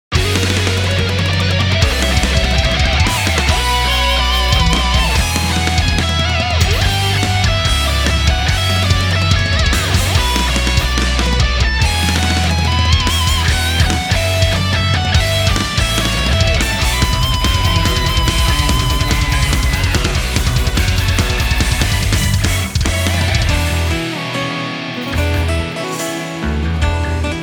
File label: Instrumental